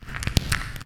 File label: rocks